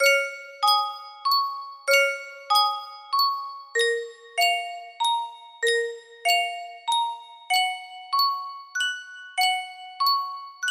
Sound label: Yunsheng music box, music box melody